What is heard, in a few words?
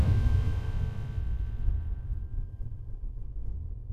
dramatic reveal, sound effects